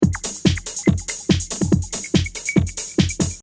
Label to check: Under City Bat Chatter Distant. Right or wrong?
Wrong